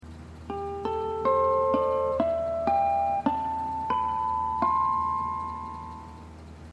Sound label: hawaiian piece